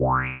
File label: noise